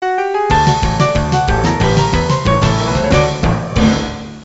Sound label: Game Won; Hidden Object Show; nds